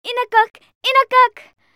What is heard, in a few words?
hint; ICO